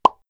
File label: pop; QB64; InForm; GravitationSimulation